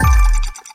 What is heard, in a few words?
clock; pinball